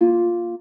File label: Harp Bb F Longer